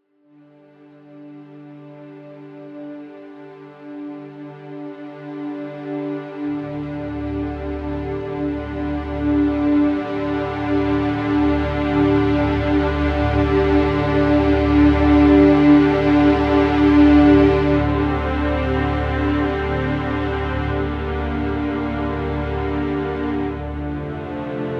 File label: String Ensemble